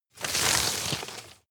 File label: rustle hard short; berufe; foerster